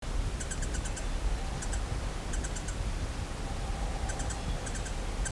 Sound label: garnet throated hummingbird